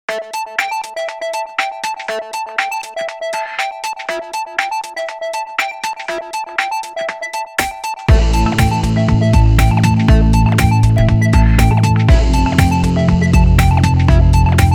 Electronic; Action 리듬

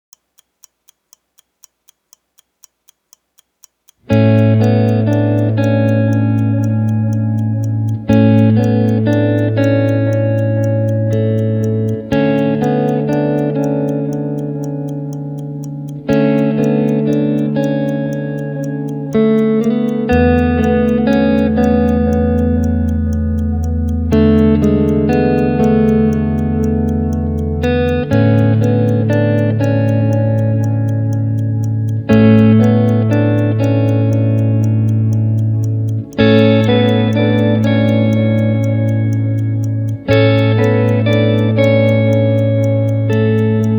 Ballad

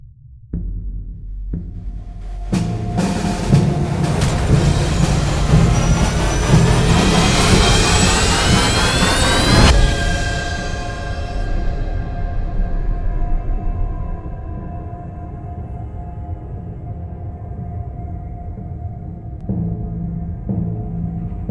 Cave, Tension 공포

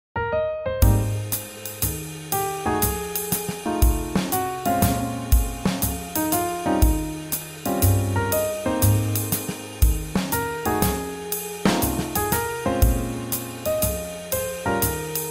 Swing Jazz

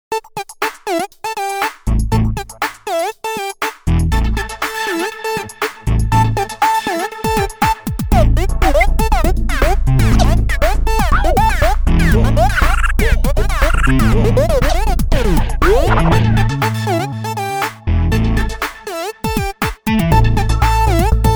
Electronic, EDM

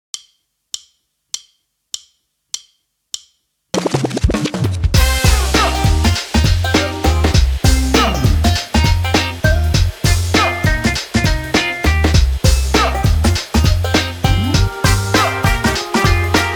Weird Banjo; 밝고 신나는

